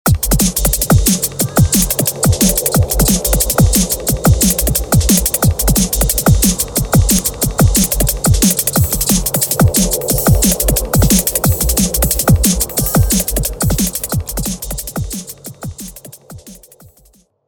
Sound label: LOOP